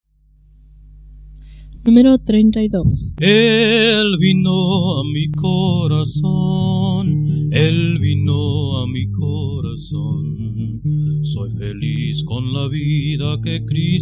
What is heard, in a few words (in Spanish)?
Coro, himnario niños